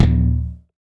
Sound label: Tin Tray, household rhythm samples hits